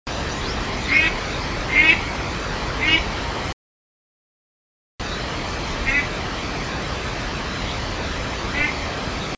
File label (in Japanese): karugamo, bird; ガンカモ目; ガンカモ科; カルガモ （軽鴨）; ハト目; 蘭梅山; 野鳥